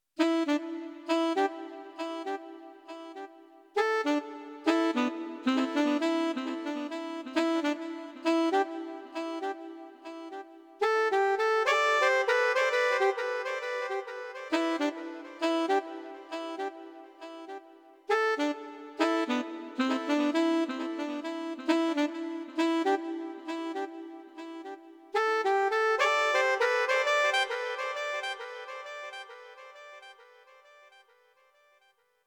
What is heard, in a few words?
lofi melody